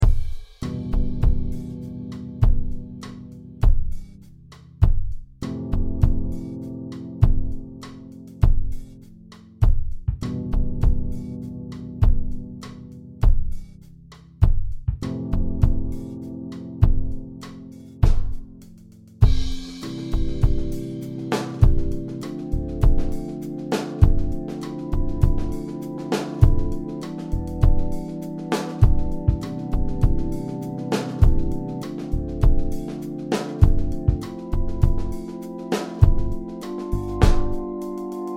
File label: C100 Displaced Funk Groove